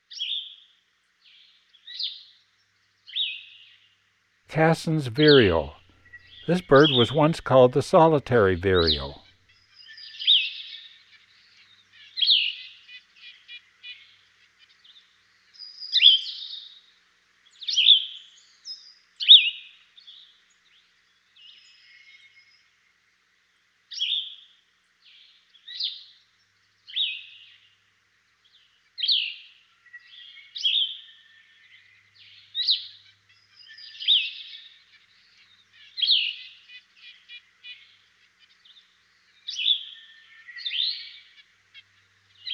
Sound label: Cassin's Vireo (Solitary Vireo); Western North America; Dan Gibson; Wildlife Identification By Sound